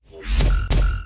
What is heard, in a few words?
bass stab